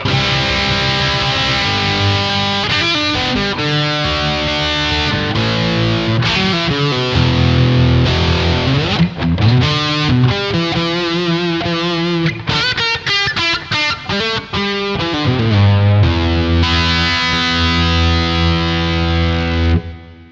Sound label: p100 dist bridge, gibson pick